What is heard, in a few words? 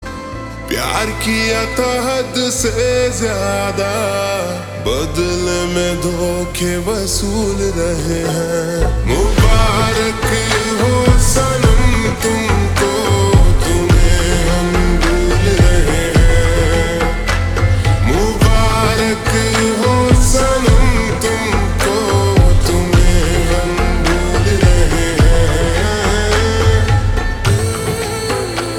Ringtone Slowed Reverb